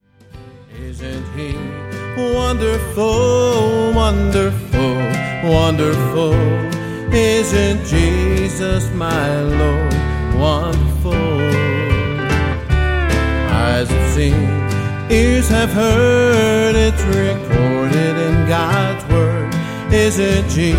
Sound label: Rhythm Section